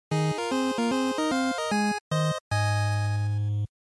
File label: NES Clear